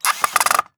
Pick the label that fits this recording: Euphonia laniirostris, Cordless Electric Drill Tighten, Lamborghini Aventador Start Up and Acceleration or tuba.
Cordless Electric Drill Tighten